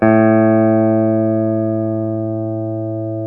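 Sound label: GUI Sus A 1, guitar; Best Service Gigapack 2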